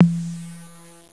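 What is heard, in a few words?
analog tom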